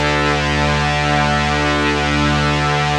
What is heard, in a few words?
OMNIVERB, ARP Synths, Analog Odyssey